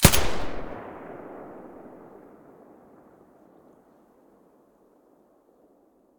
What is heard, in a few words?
silencer ump45 mono, weapons